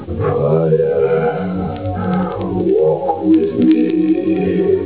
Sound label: fire